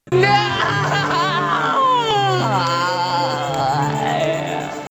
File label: zombie die; cstrike; zombie plague